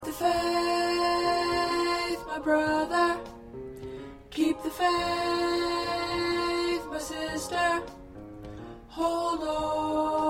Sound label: Tenor, choir